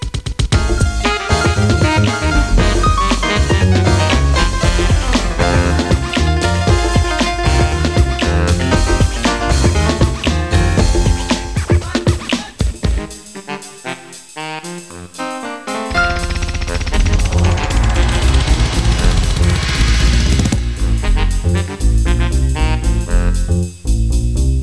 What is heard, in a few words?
music; bebop